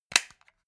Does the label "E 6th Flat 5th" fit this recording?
No